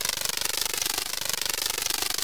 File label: lawnmover